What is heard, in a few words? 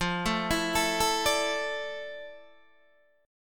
F Major 13th Guitar Open D